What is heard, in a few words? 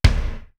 INDUSTRY, TOM; East West - Global Sounds; D4 BD SD TOM